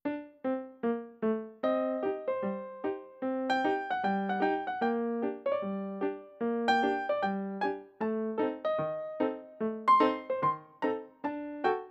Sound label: piano, Ragtime